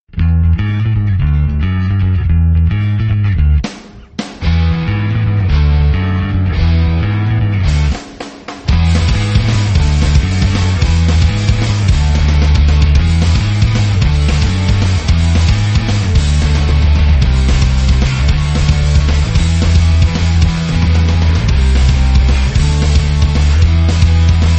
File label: Music Rock; Monster Truck Destruction; Android